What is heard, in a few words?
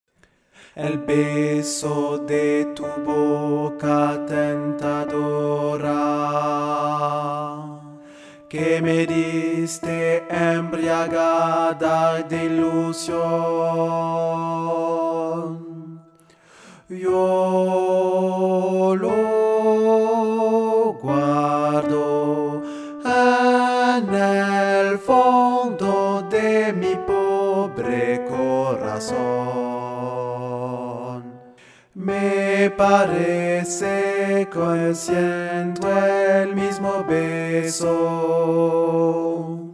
Alto; Dulce embeleso